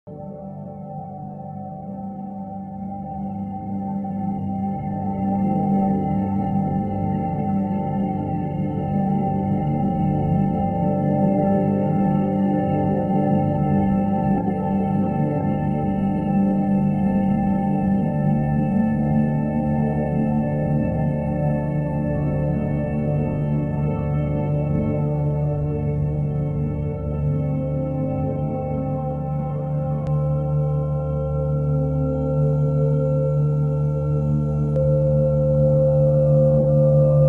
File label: 528 Hz